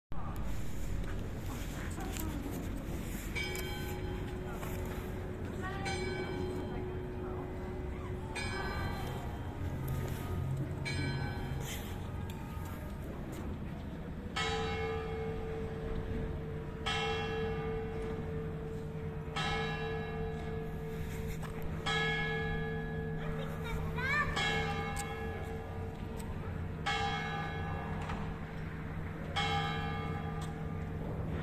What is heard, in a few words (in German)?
Glockenschlag Stadtturm